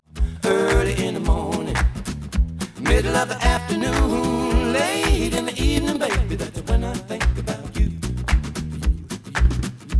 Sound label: noised; 4bit